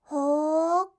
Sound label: hoo, reaction, voice